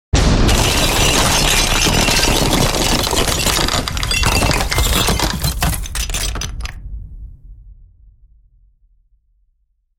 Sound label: Realistic and terrifying sound from inside a building of a bomb explosion; Bomb Hitting Building; Realistic and terrifying sound from inside a building of a bomb explosion causing severe damage.; Blast